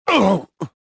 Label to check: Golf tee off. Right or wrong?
Wrong